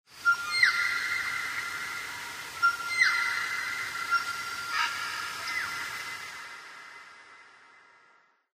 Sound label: animal call bird; Source Engine; tf; lair